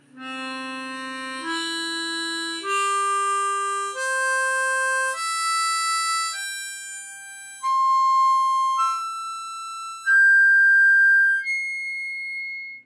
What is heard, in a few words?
C Major Scale 1 to 10 Blow, harp